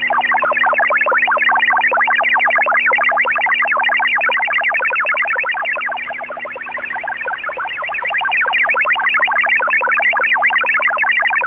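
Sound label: Chinese MFSK; CHN-MFSK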